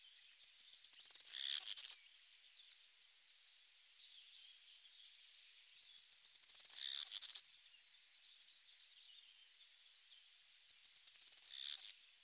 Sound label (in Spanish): triguero, fauna